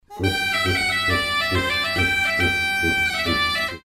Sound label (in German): Brummtopf Klang